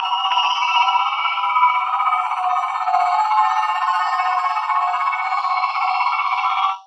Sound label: speed loop; rengar